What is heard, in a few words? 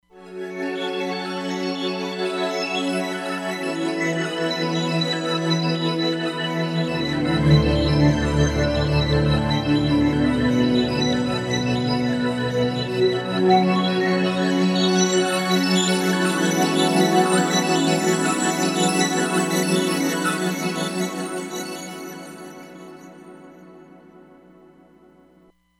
Virtual Human, Roland Fantom XR